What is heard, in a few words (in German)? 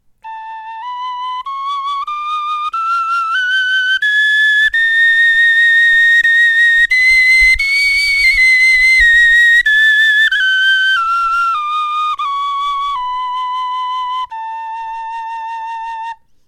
tonleiter, knochenfloeten